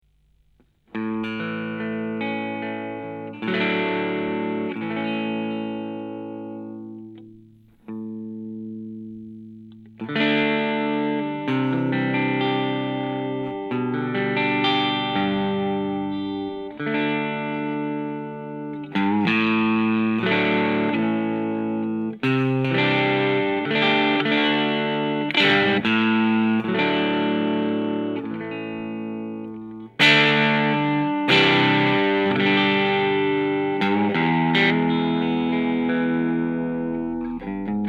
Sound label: Highwayman amp Crunch ES335